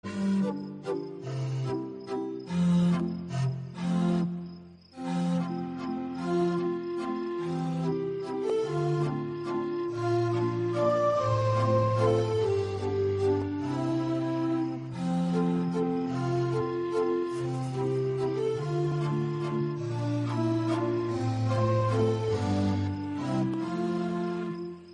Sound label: organ